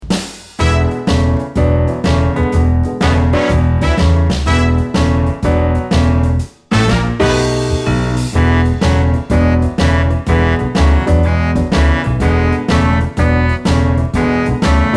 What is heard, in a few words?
Frére Jacques instrumental